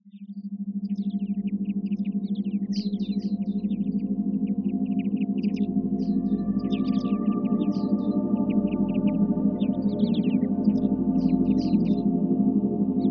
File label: Forest Sounds Meditation